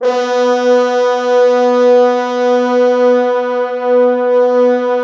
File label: Orchestral Brass